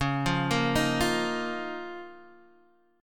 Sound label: C sharp 6th Add 9th C sharp down Guitar Half Step Down; C#6add9